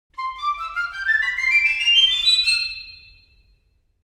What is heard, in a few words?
The upper range of the piccolo; sampled woodwind instruments; Berlin Woodwinds; Orchestral Tools